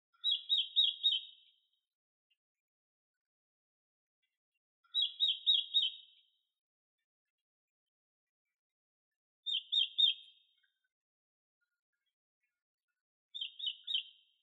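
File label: soushicho song, red-billed leiothrix; saezuri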